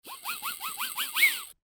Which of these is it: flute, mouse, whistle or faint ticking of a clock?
whistle